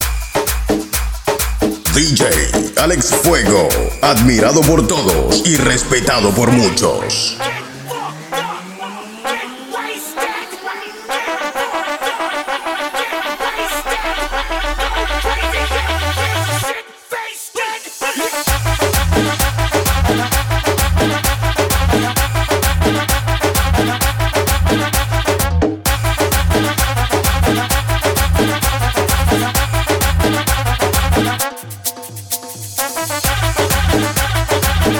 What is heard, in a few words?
130 BPM, bootleg mix; guaracha